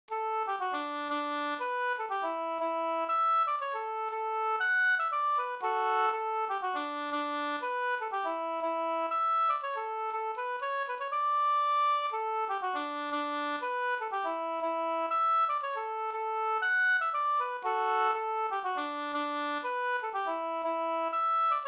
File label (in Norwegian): pols Rorospols; Marknadspols fr Brekken utl av Jonas Hjalmarsson